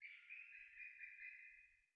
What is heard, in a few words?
ambient; World of Tanks